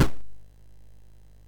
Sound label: sid MOS6581R2; California Games